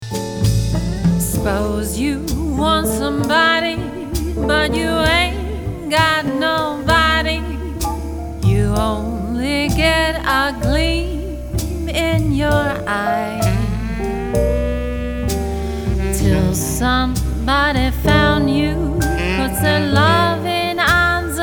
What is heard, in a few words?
blues